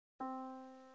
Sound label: Yamaha Disk, piano sound